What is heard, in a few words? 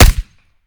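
akilo47 suppressor player, weapons; viper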